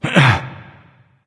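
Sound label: nonverbal surprised; smite; voicepack; ullr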